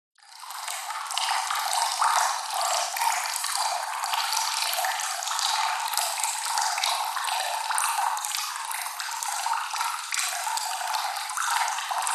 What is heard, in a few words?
nature water trickle drip cave cavern